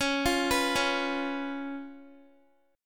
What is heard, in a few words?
Db 7th, Guitar; Db7 arpeggiated